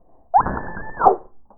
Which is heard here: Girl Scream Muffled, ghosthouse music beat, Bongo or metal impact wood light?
Girl Scream Muffled